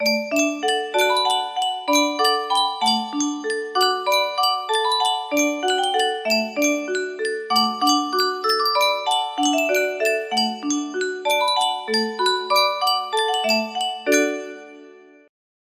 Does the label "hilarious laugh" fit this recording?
No